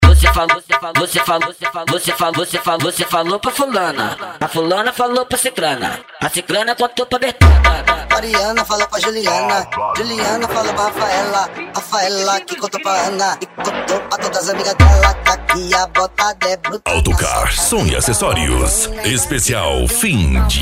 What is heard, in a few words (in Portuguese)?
funk agressivo ritmado e funkadao